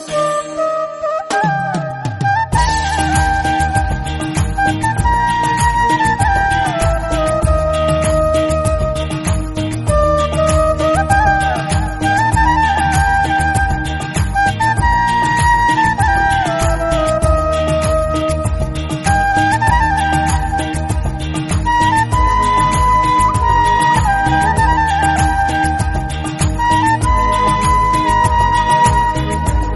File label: Flute Version